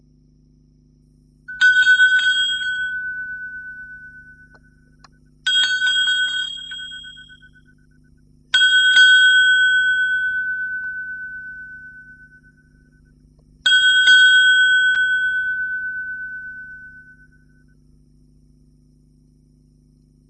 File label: shop bell